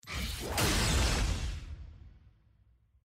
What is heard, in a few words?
clunk; mordekaiser